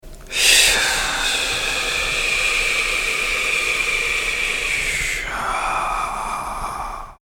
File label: Six Healing Sounds Qigong 呬 SI